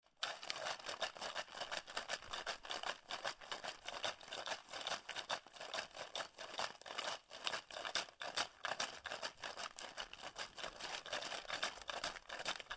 auf kies gehen und laufen

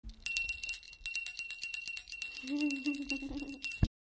gloeckchen

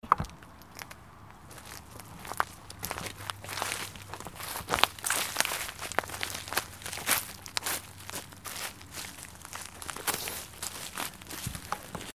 Laufen auf Waldboden

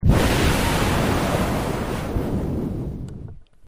pusten

